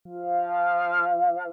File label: tone